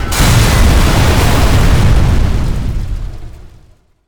Explo Large Metal; Destruction

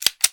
Gun, Hand, Pull And Release Slide; gun and weapon sounds; captain audio; fully loaded

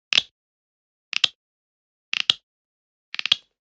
Generic Click Plastic Small; boom library; The Interface

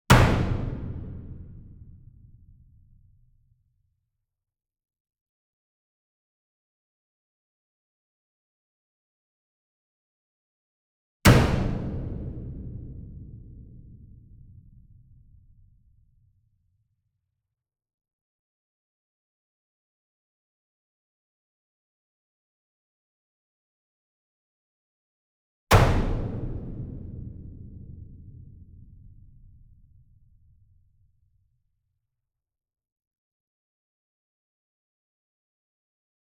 SINGLE DRUM Verdi 66 Inch Hit Whip Beater Medium; boom library; Cinematic Strikes Construction Kit

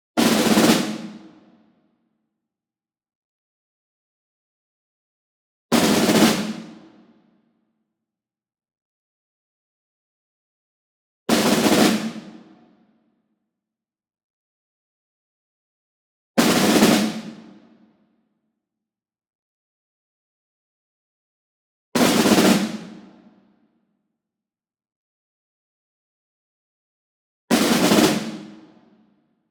ENSEMBLE SNARE Group Roll Medium; boom library; Cinematic Strikes Construction Kit

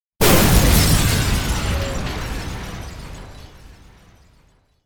Explosion Heavy Glass Destruction; Hollywood Edge; sound designer's toolkit v2

